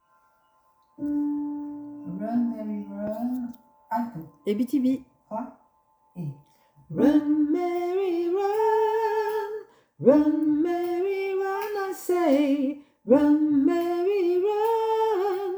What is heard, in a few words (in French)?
voix BTB fond alto